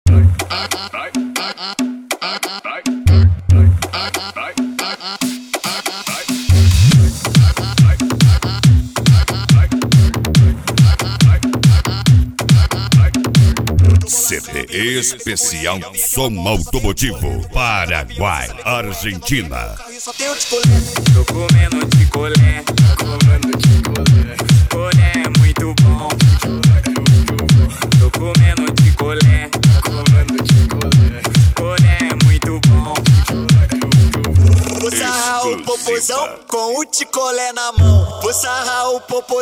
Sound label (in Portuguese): som automotivo